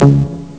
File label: bass synth; Amiga Soundtracker Sample Packs